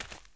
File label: walk, dirt FOOT; The Elder Scrolls III - Morrowind